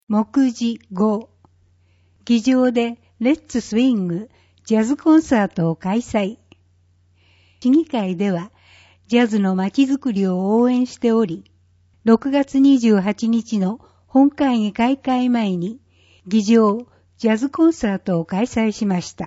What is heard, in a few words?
jazz concert; 議場でlet's swing！ ジャズコンサートを開催！; あなたと市議会 197号